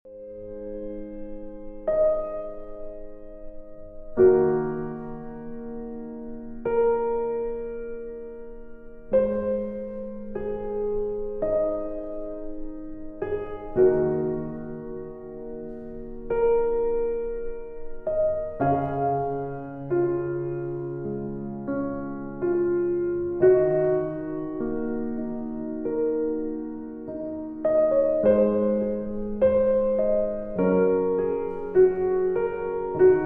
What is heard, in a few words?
Peaceful Piano